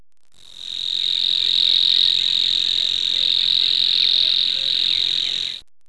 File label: Locustella luscinoides